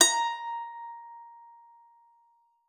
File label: PSAL A#5, psalter; Xsample VOL-8